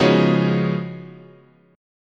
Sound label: C# 11th, guitar chord